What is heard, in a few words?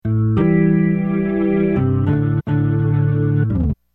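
Hammond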